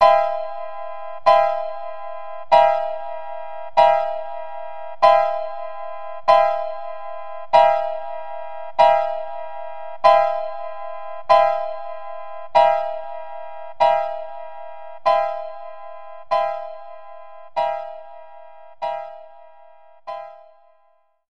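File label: background music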